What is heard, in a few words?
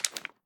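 pistol reload fast empty end; gmod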